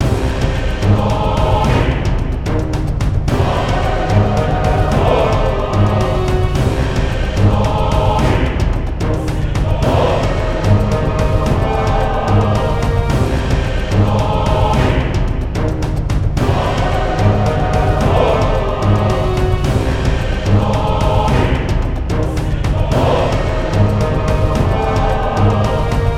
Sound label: Garden Stinger